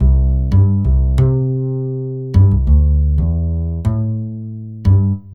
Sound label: A Bass; Сэмпл Bass (Chillout); 90BPM; Loops; БАС